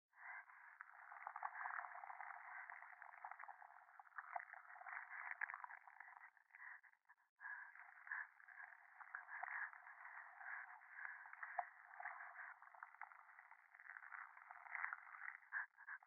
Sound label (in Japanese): 電話 右耳快感吐息 触手